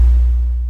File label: bounce